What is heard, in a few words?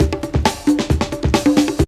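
CONGA DRUM 134 BPM, loop; 122-146 BPM; CONGADRM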